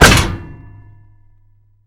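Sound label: hitting a pot with an iron lid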